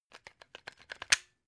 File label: plug unplug clatter